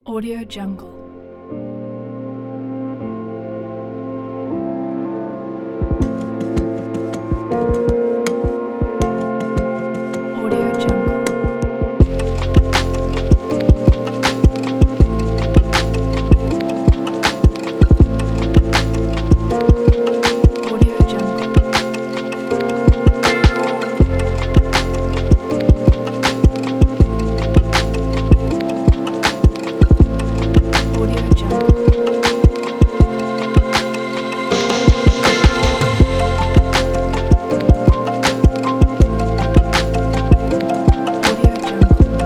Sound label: warm chill